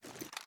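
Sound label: sm reload empty xmag movement; gmod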